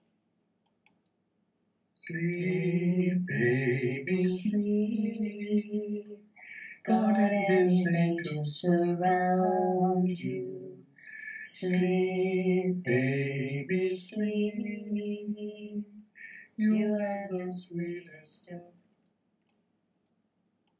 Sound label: Sleep lullaby harmony fadeout